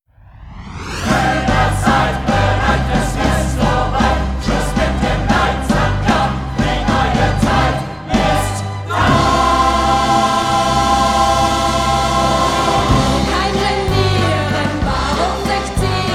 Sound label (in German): Chor Musical; ACS Produktionen